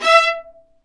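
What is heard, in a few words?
Marcato, Strings; Vlas Marcato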